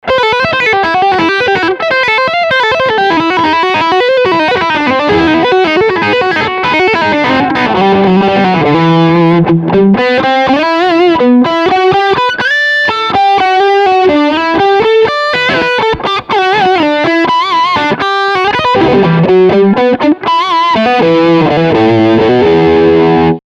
Lead

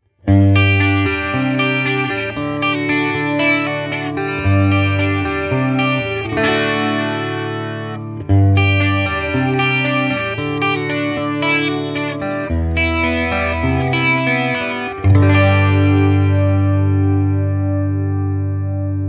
Boss Katana Artist MKII Clean

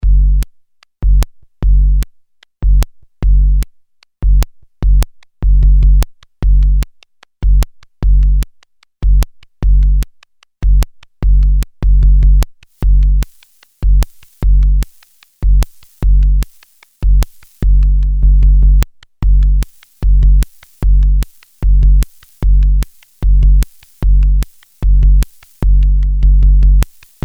ms20m ws beat

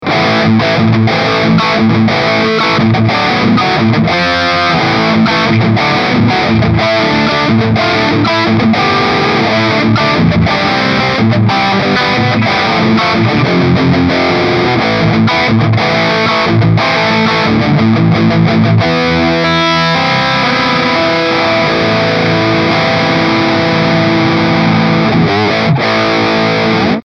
Engl Savage

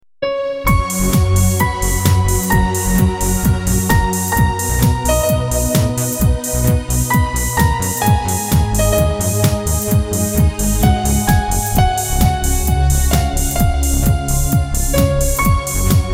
fun house

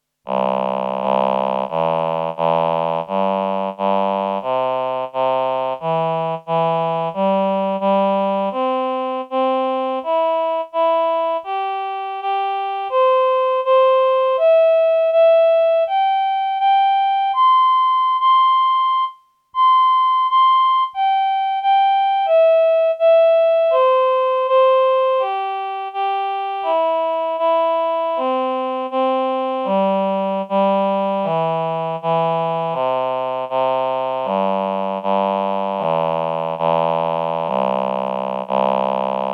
VP330 CS340 Male8 roh

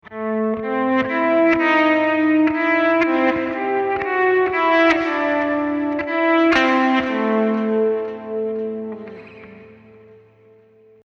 Boss GT-10 Twin Rev

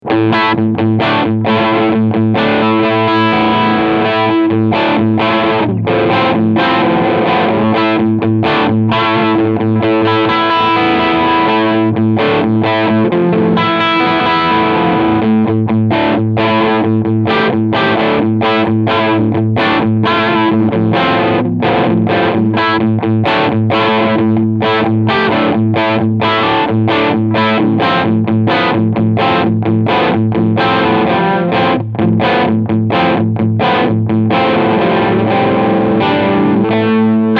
Jackson Audio El Guapo Distortion MAGENTA

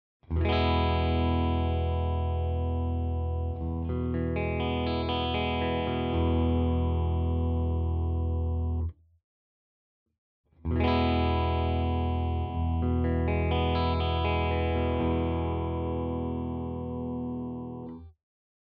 clean Tone Knob; Schecter Exotic Ziricote

